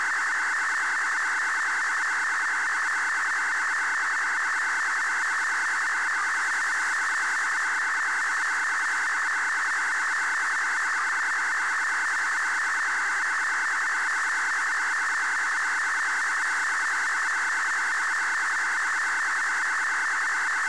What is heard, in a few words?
Continuous AFSK Data